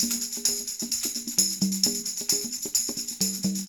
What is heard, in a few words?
tam con; Percussion